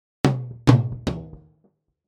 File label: Toms three Combined deBleed ON